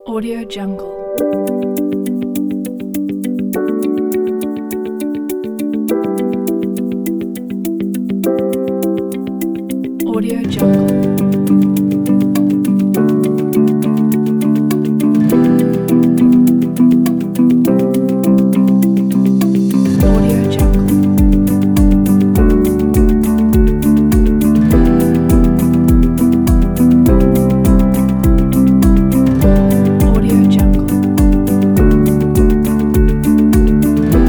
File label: upbeat background